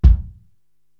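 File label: BD Vintage Jazz, bass drum, drum machine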